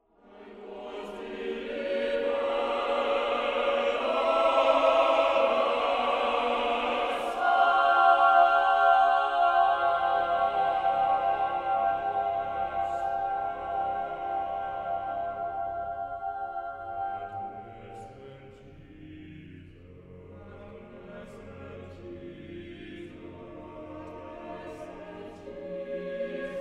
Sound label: lute lullaby; lute book